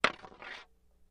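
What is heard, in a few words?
Staff Hits Light Scrape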